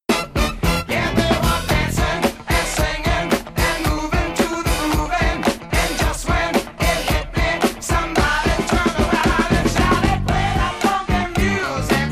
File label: hook only; no white boy reference